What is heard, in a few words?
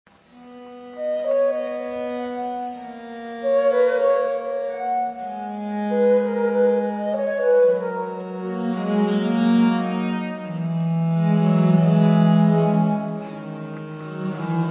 sonate largo; boismortier